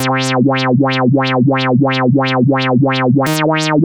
Rotor, dance electro

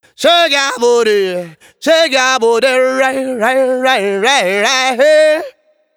Shake; Reggae (Регги)

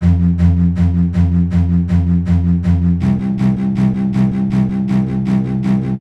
Bass Staccato

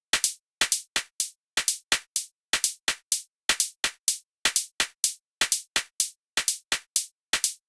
Housed Beat, drum, dance electro

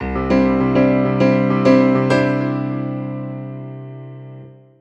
Rob piano

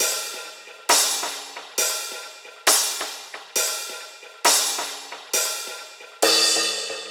Platt Kit C; Барабаны

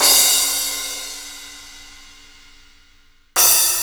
Double Crash; Big Beat

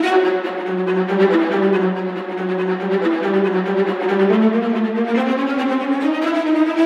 viola; виола

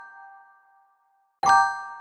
Glockenspiel; металлофон

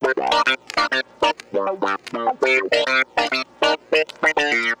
Funk Dist

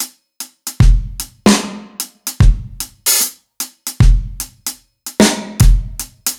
Normal Kit; Reggae (Регги)

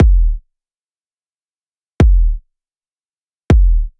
Bling Kit

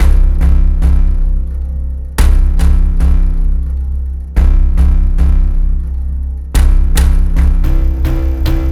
Broken Bass

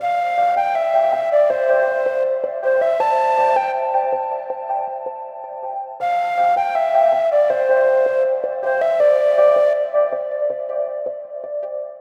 Synthflute; Сэмпл синтезатора (Ambient); Loops; 80BPM

